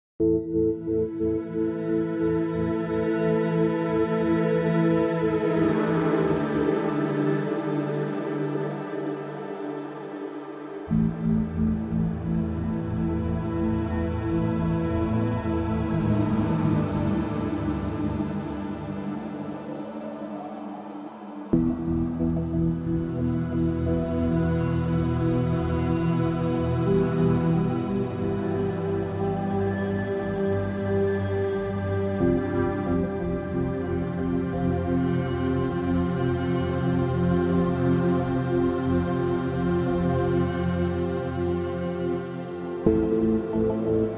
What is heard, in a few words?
Destructive Ambience